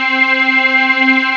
PAD C4, SYN PAD; MKS

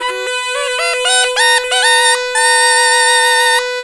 PUNGI

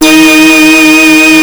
OB 19 D#4, Rare Synthesizer; Hollywood Edge - Giorgio Moroder Rare Synthesizer Collection; OB 4 VOX.

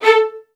XST1 A4, LOUD VIOLINS; Q Up Arts; Denny Jaeger Master Violin Library

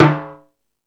Latin Drums, E-MU Pro-Cussion

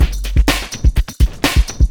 housefunk, beats; Sonic Foundry (Sony Creative Software); Ugly Remnants VOL-2

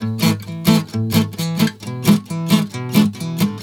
GUITAR, Balkanish Traditions

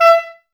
wind clarinet, Clarinets, Wood Winds; Miroslav Vitous - Woodwind Brass Ensemble; 90's Sample CDs

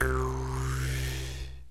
JEW'S HARP

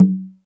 Jungel Percussion, E-MU Pro-Cussion; drum machine

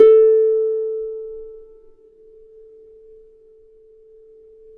A3 HARP MF; Complete Harps; USB Soundscan vol.62; AKAI

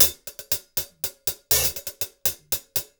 080, Top Ends, Beats; Zero-G; Monster Beats

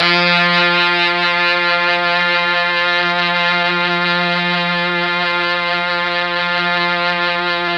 F2 Brass, Mellotron MKII; Mike Pinder - Mellotron Archive CD

